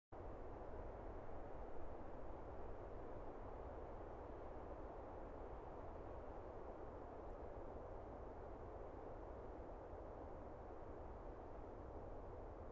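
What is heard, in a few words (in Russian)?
звук зимнего леса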